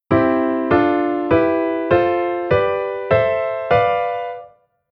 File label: Chord Diatonic Triad